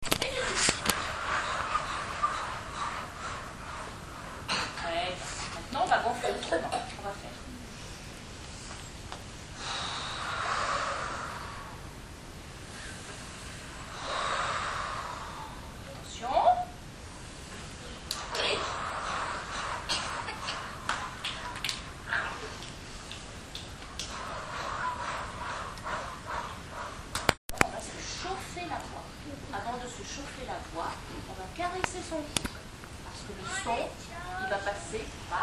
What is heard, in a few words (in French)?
Exercices echauffement chorale